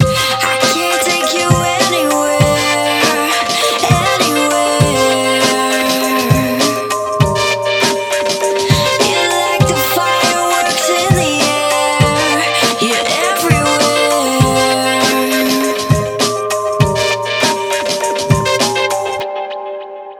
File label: pian